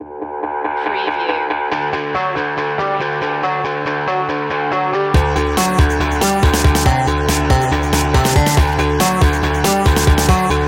A 'Rock-tronica' track with a corporate feel, Techno-Rock / Electrorock